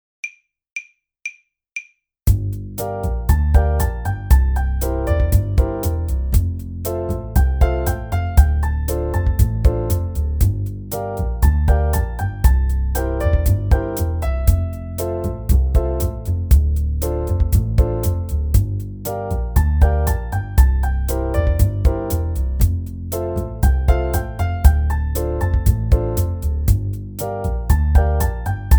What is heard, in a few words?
bossanova